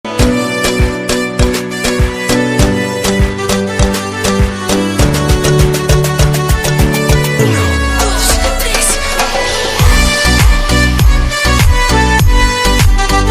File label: Cuba music ringtone instruments ringtone